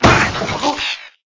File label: Robot; Clickergame